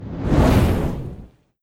Torches On

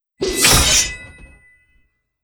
Metal Shields Sword Impact

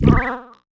Jump; Chaser

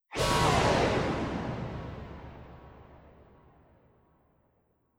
Scream

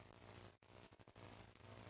El-Hum-Surge Loop; viewfinder

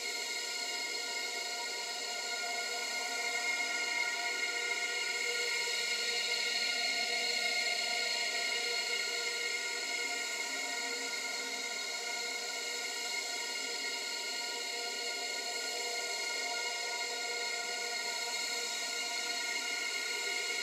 Amb Musical; Botanist

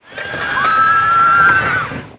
Scream

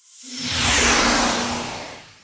Music Frontend

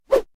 Swoosh; Dino Donkey Dash; Swoosh Sign

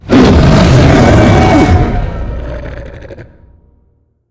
Monster Evolving 1st Evolution; Monster Ralph Evolving 1st Evolution

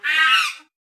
Monkey; rabbids multiverse; iOS, macOS, Mobile

